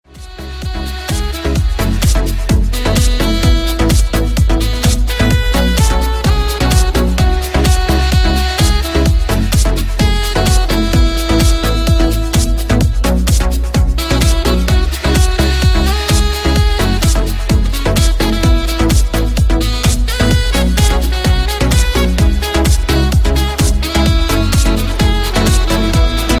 sax ringtone

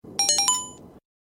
notification ringtone; gta v

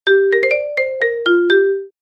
marimba notification ringtone

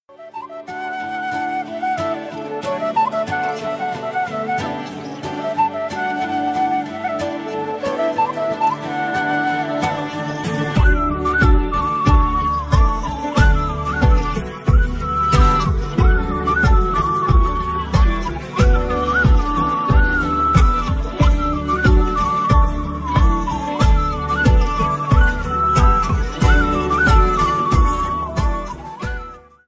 flute ringtone

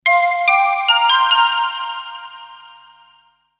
message ringtone

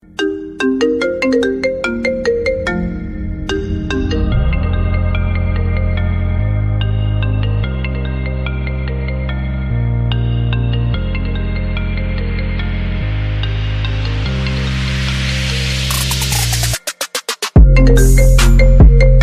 iphone ringtone

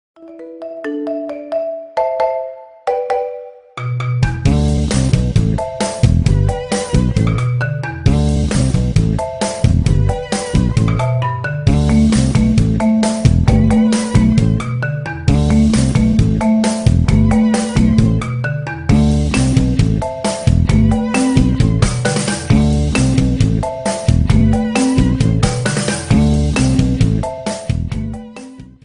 marimba remix ringtone

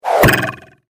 arrow sound ringtone

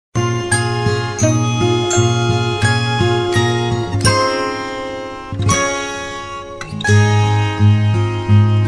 phone music ringtone

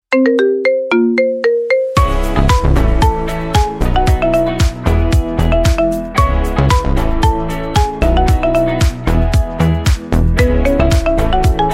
marimba ringtone

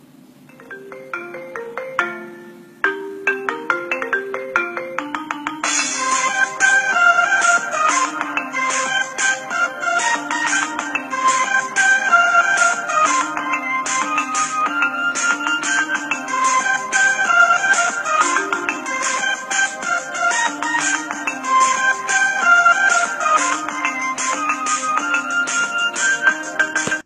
marimba remix ringtone

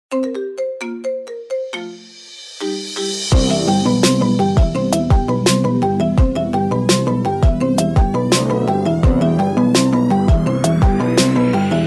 marimba remix ringtone; stranger things

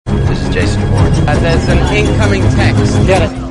sms ringtone; jason bourne

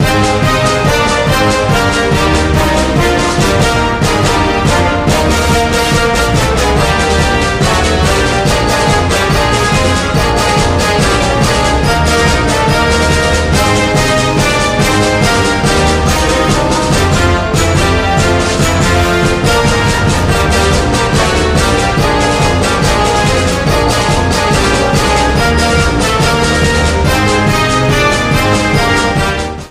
fight victory march ringtone

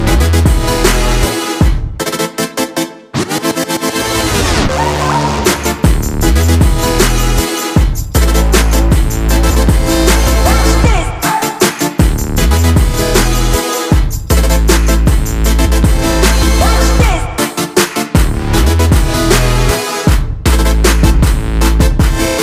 ringtone

